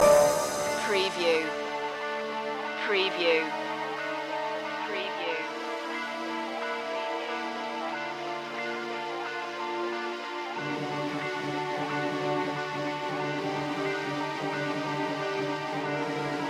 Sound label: agent run loop